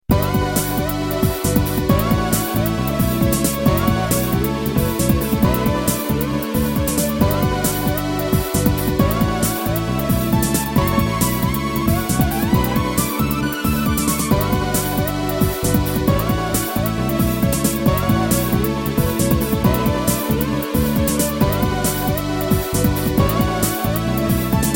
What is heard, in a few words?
music end; psylocke